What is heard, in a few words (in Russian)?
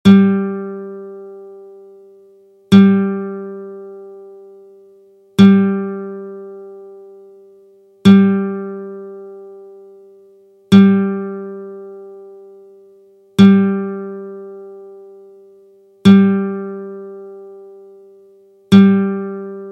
struna Zvuki strun klassicheskoj gitary